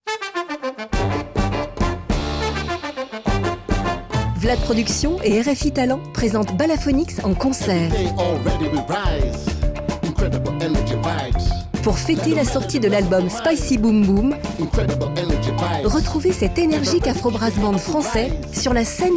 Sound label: BALAPHONICS; Bande-Annonce